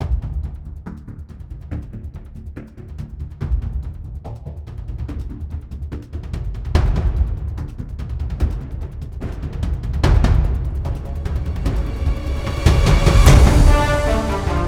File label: Lead Up Thrill